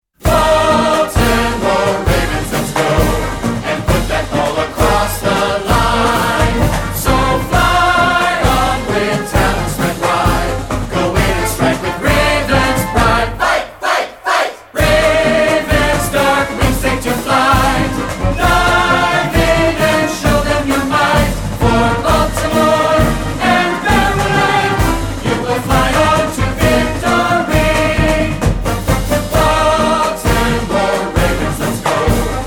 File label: fight song; baltimore ravens